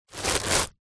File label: wrapped loaf of bread pick up; zapsplat